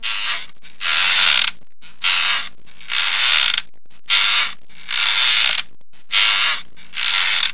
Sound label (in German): stuhl, Stuhl Knarren